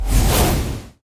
win sweep Horizontal; wild worlds

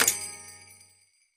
spin Button Down; secrets of christmas

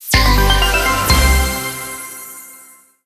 medium Win; joker pro

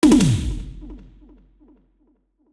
Toms; knight rider

